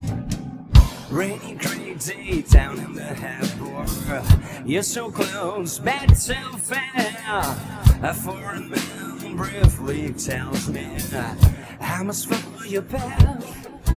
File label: percussive